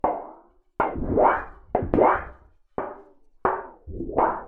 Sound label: Storage Heater